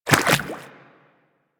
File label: Infested Small Walk Water, Footsteps; Warframe